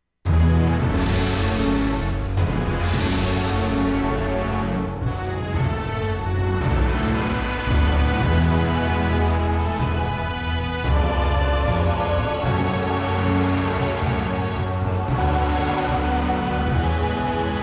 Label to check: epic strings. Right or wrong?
Right